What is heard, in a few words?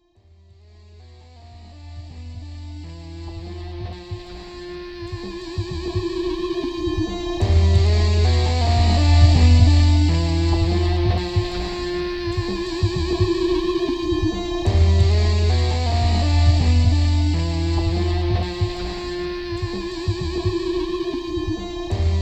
Sneering Loop